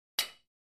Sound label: Free Weights Exerci